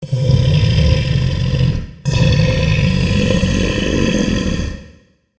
monster sleep; easyrpg; WitchsHeart

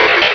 Cry

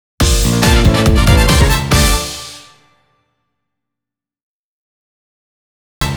Jingle - Quest - Fail

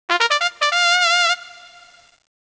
trumpet; easyrpg; WitchsHeart

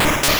Splash; Pokemon Essentials; PKMN Estelar 1.3

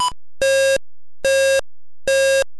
Call End; Pokemon Essentials; PKMN Estelar 1.3

